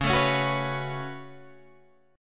C sharp 7th Suspended 4th Sharp 5th C sharp Guitar Open D; down; chord shape